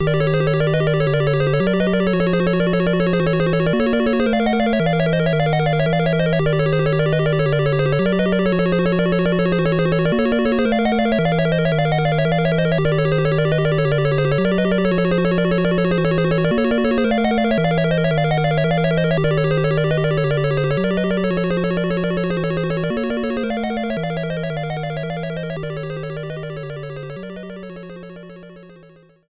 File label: Castle Game Fast